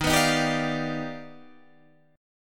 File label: Eb Augmented Major 9th Eb down Guitar Open D